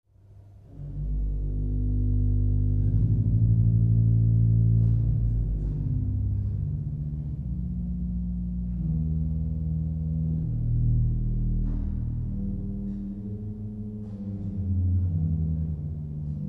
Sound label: Contrebasse, orgel; franz orgel